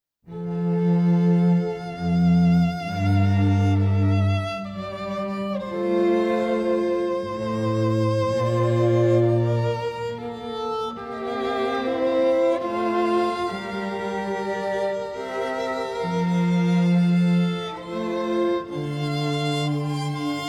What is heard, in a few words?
Largo, String Quartet; from Dbl. Vln. Cncerto in d minor; Bach